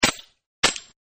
Handgun Silencer, Handgun With Silencer Attached Firing; Handgun With Silencer Attached Firing-2 Takes; Pistols